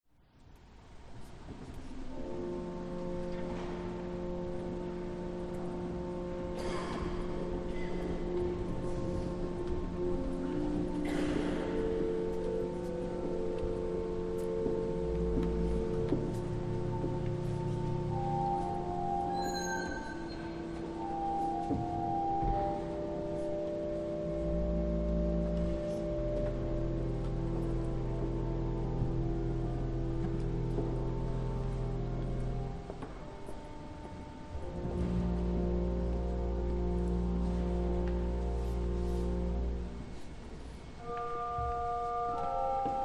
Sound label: Organ Improv; Winter Colloquium